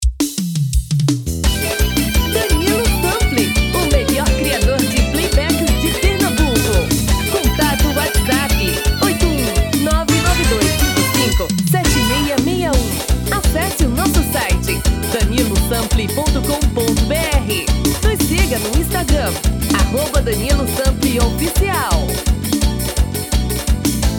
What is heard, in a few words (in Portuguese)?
MARACATU Caboclinho; tom feminio